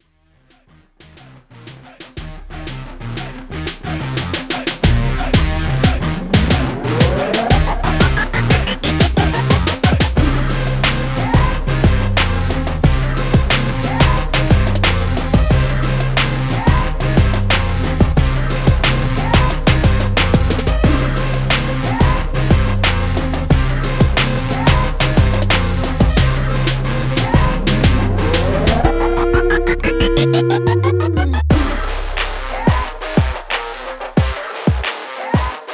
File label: dramatic action score